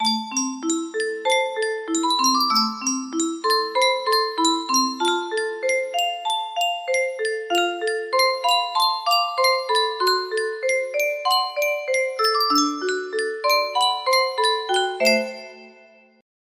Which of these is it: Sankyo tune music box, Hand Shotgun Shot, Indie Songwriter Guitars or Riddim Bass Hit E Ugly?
Sankyo tune music box